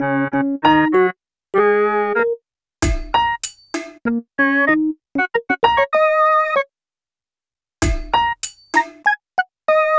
Melody, Hip-Hop

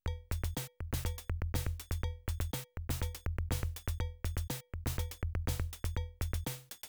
BREAK

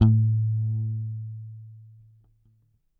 Whole Note One Shot A, Bass; Bass X

